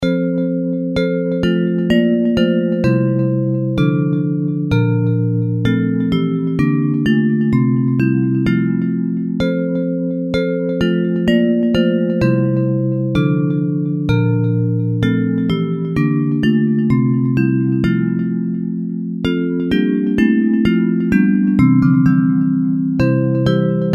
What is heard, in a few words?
Bells